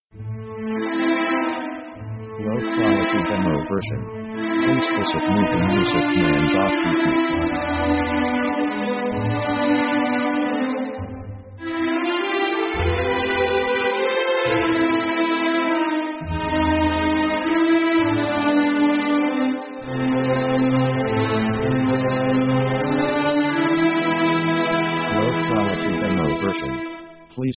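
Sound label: orchestra mournful waltz; sad themes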